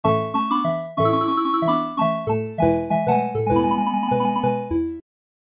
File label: marimbaphone